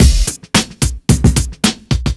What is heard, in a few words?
Beat 110bpm; musicradar